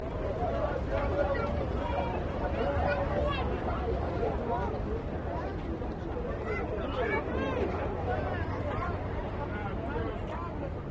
very busy street; star-wars-rogue-one-boots-on-the-ground

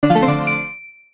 character lvl; sweetcandymania

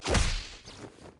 generic hit obstacle; frozen rush

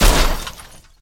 crash; Stud-Rider

